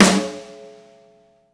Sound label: ambient snare sound f sharp key